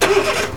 Car Ignition

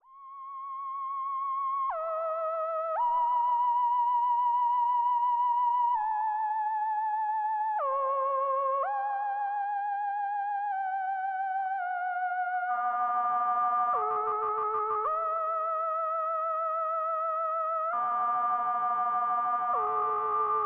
Moog Melody

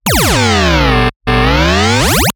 Fractal Laser

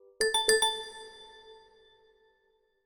Message Ringtone

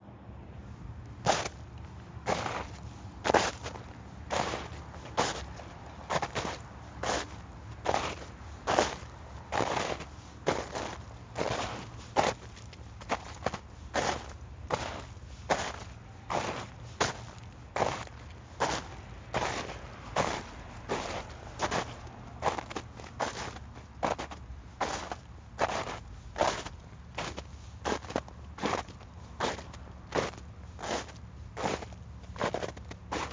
Snow Walk

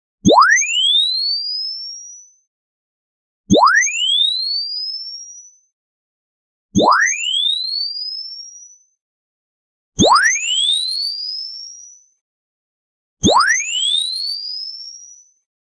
Bomb Arming; Snakebarney

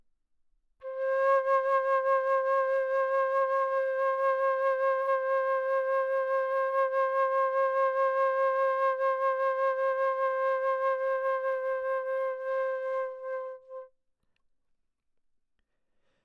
Flute note

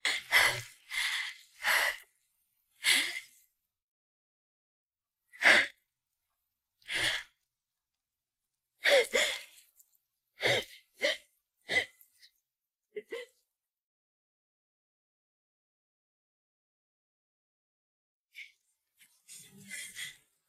Crying

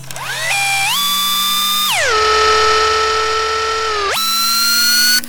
Robotics Move

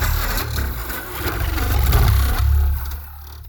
Mech Power Up

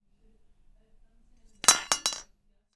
Falling Iron Handsaw

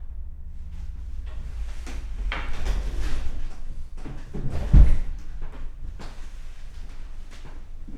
Living Room Ambiance

